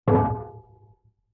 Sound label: Wood Hit Mic In Bucket; Vintage Cartoon